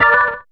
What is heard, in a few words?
ORG RIF; ORGAN; E-Lab; X Static Goldmine 2; akai